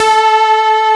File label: C# GROOV, WIND & HORN; AKAI S6000 CD-ROM - Volume 1; BrassWind